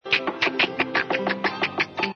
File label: drinking liquor